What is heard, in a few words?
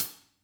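hats, drum kits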